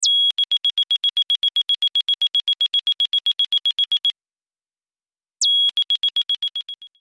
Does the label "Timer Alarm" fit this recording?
Yes